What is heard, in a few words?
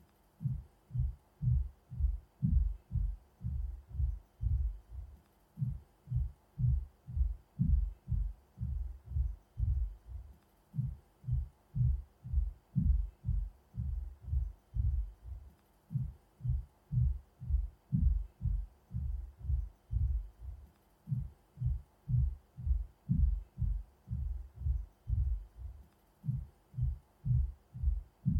footfalls